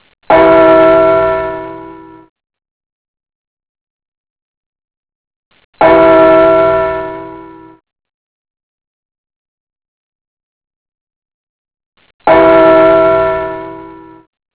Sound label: bell